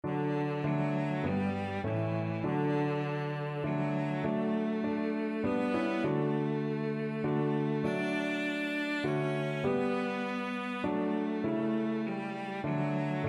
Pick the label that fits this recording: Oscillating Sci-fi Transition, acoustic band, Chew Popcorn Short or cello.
cello